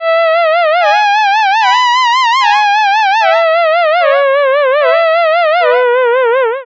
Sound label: violin partita